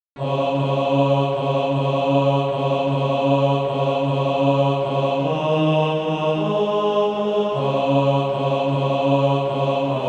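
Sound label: bass, Practise Music; Crown Him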